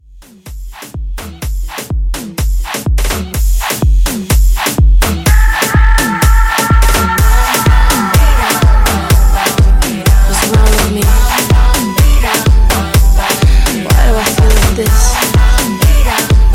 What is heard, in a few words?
Afro Pop Re-Drum 125 CLEAN